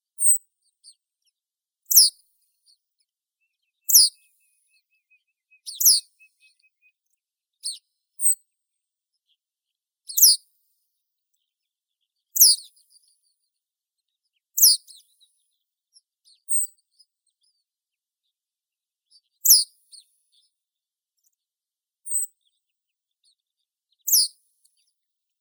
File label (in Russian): ovsyanka polyarnaya emberiza pallasi